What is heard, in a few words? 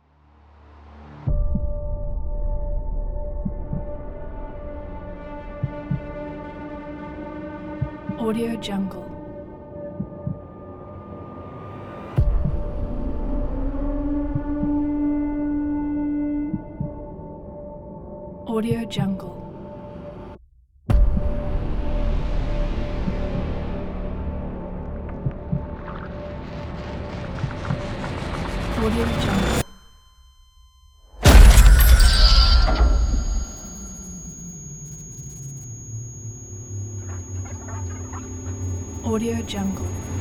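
horror trailer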